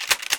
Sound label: get remington; firefight